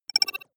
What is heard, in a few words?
data transfer pluck